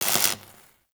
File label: Worker Welder Stop; Warframe; Grineer